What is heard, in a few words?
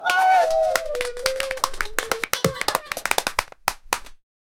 confetti pops balloon boi, children